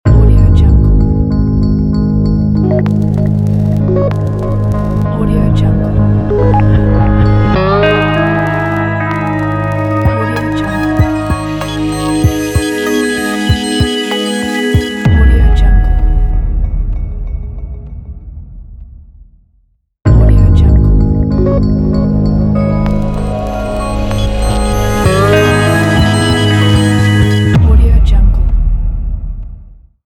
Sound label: Digital Transform Logo